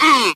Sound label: "ah" cartoon, Voice